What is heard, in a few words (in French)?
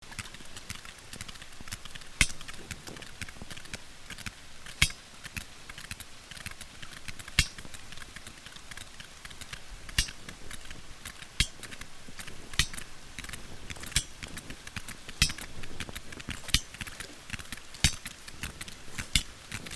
cachalot; GLOBICE; fiches cétacés